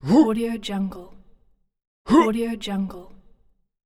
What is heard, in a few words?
male jumping